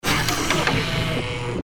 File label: electric door